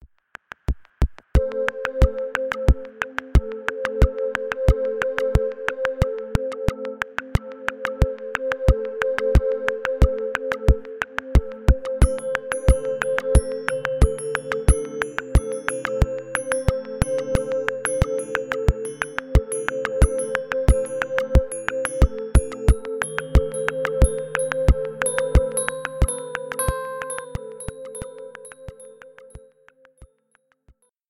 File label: piano